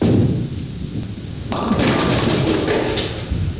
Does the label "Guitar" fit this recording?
No